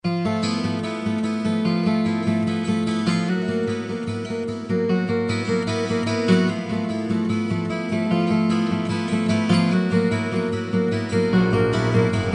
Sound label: Tristram Guitar (Orchestra); Diablo Anniversary